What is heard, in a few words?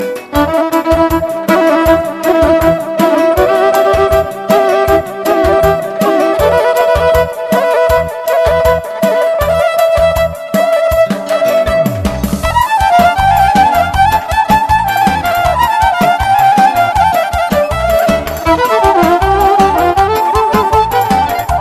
Instrumental